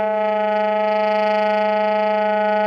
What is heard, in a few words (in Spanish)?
Tremolos